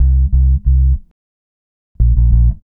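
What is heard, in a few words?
reggae bass C; Best Service Advanced Media Trax Modern Composer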